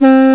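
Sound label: synth brass 3, tones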